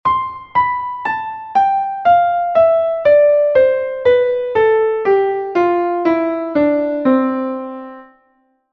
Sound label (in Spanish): escala descendente